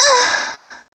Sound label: Moan